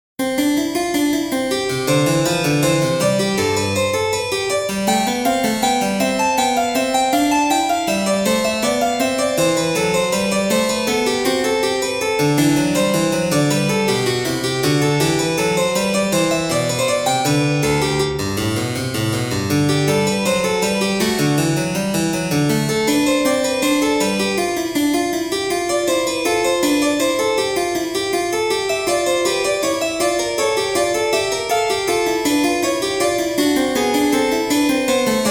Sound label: Waltz